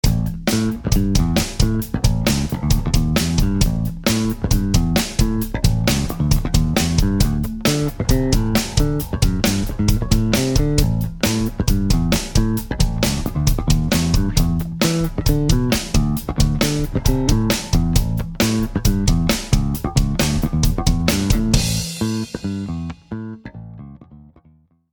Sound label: funk basic